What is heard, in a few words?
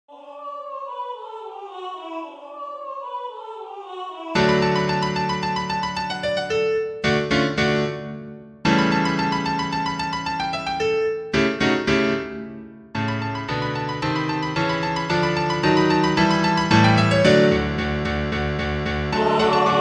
ring forth ye bells all parts